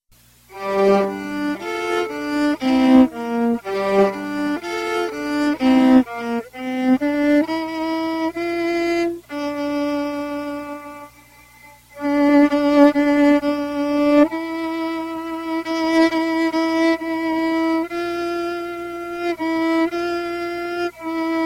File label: Double Stops Grandiose